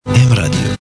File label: jingle, radio